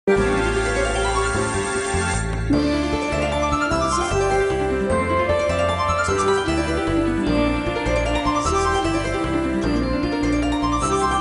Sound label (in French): Chant de la Déesse à la lyre; Skyward Sword